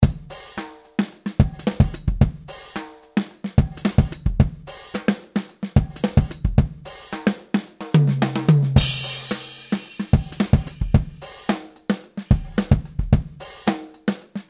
Kit; 4 Factory; WFL 1958

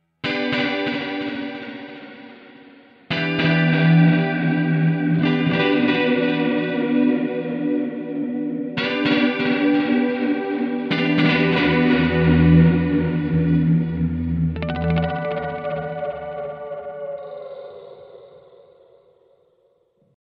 Eventide MicroPitch Delays; Elevator

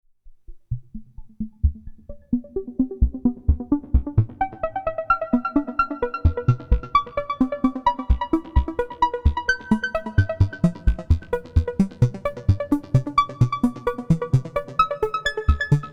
Sequence Range; Xaoc Devices Sofia

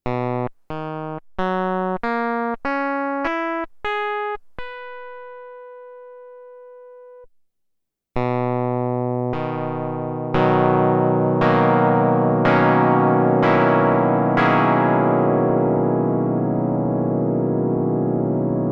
Pulse 2 Para 4

